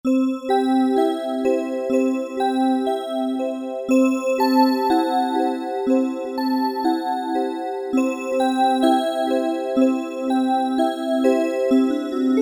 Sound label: AdditvBell, Alesis Z2 EuroDance